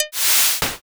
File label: fishing cast